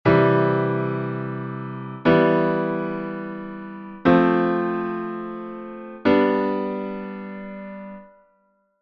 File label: melody chord